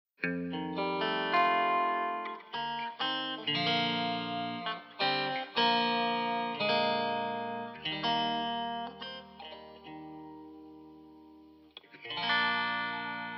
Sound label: Bb No Backing Vocals, backing tracks